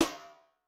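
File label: Rubber band, Snare; Yamaha DTXpress-II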